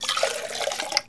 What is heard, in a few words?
get drinks; SpongeBob Diner Dash